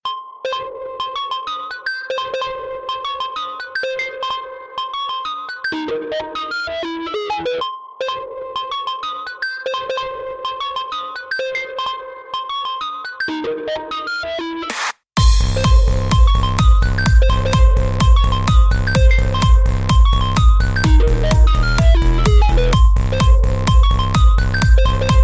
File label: Scratch